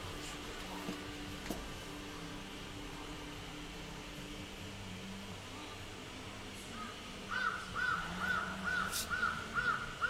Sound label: suburban, muffler